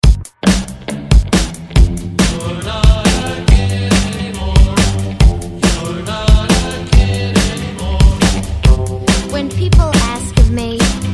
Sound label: Re Drum 140 Bpm; DJDOLLS